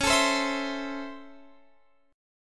Db Minor Major 7th Sharp 5th Db Guitar Open F; DbmM7#5 strummed; JGuitar

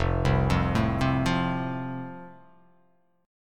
F Major; arpeggiated; 1,3,3,2,0,0,x,x; down

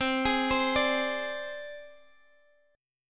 C Suspended 2nd Sharp 5th, down; Csus2#5; arpeggiated

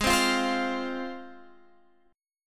C Suspended 4th Sharp 5th G sharp

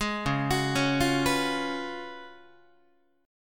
Db 11th, Guitar; arpeggiated

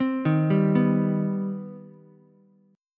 C Minor Major 7th, Ukulele; arpeggiated; JGuitar

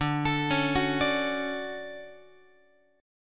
D Major 7th, Guitar; arpeggiated; JGuitar

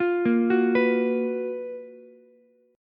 Gb Major 11th, Ukulele C6; GbM11 arpeggiated; JGuitar

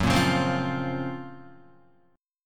Gb Major Sharp 11th, Guitar; GbM#11 strummed; JGuitar

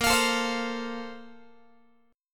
A sharp Major 7th Suspended 2nd, Guitar; strummed

